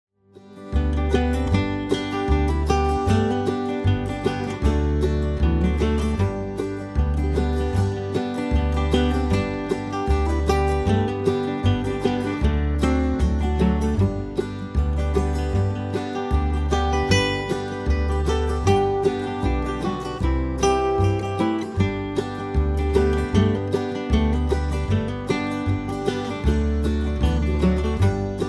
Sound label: guitar fragment